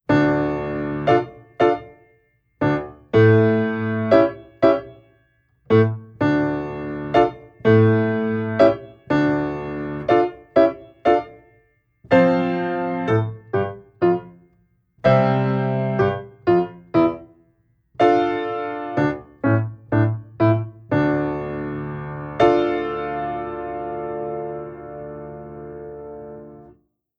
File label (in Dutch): Het pedaal ingedrukt houden, Piano oefeningen